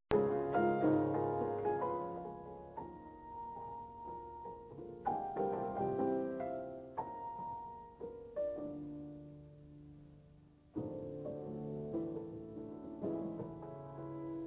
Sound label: Piano G5